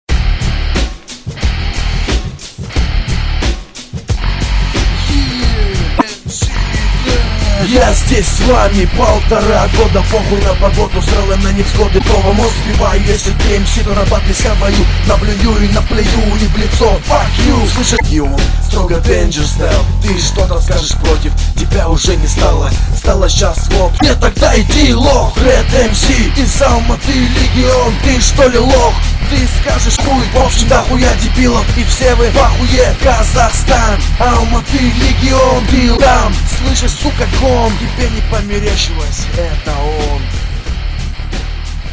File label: Hard Rock